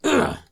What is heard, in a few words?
Male Hurt